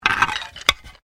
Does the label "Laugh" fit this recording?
No